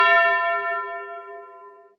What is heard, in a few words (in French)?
cloche; Limonest